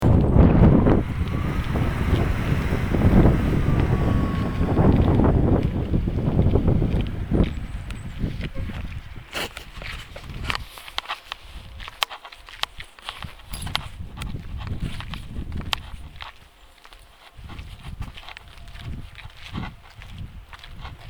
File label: diegetic noise